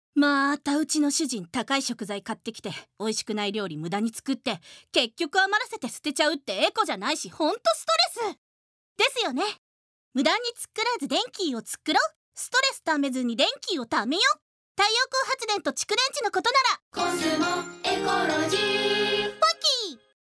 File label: radio